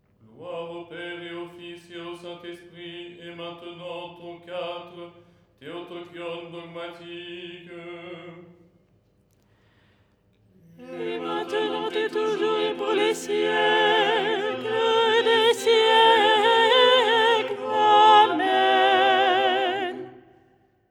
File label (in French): soprano; " Gloire… et maintenant… "; Les 8 tons en français; ton 4